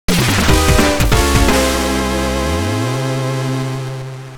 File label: Lose Jingle